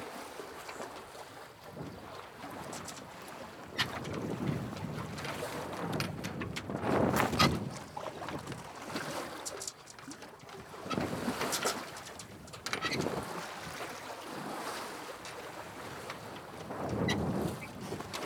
Sailing

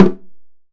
Snare Drum; Percussion Samples

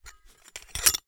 Hole Saw Rattle, Hole Saw Bits

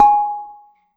Bonang P, Gamelan

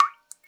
Drips

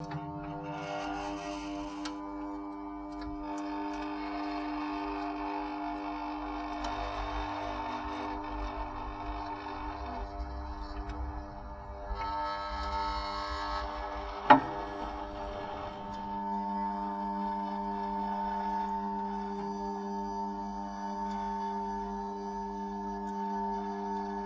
Toaster Hum; Kitchen

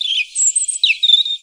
Birdsong Bit, Oxford Island Lough Neagh

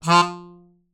Melodica Short Note

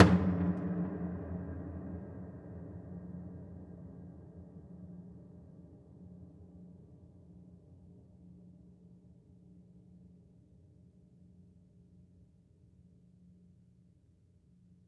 Body Thump Ped Sgl, Piano Destruction

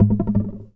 Classical Guitar Bounce; Classical Guitar Extended Technique Stereo

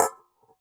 Coin On Collander

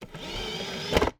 Insert, DVD Drive Internal; DVD Drives

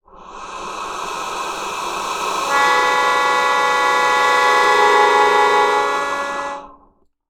Wind, Melodica

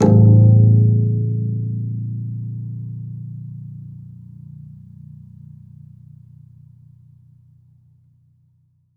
Classical Guitar Extended Technique Plink

